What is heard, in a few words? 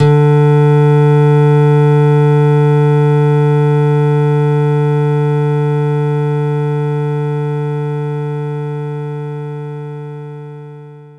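nylon guitar D3; knights try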